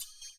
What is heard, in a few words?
CYM, Ethno Techno; ILIO - Ethno Techno